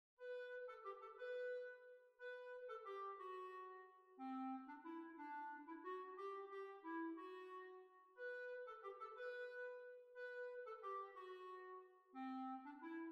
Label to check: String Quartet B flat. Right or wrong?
Right